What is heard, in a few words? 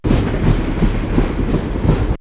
Mean Machine; X-Static Goldmine